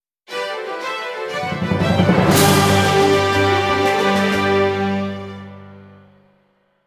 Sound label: (Jingle) Minigame Won; Sgt. Byrd